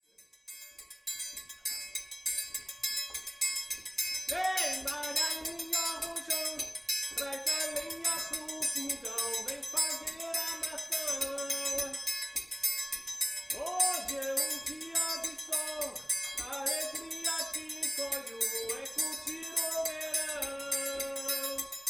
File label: baiao